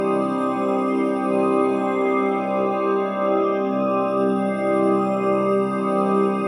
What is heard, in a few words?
META PIPES, Pads; Distored Reality 1&2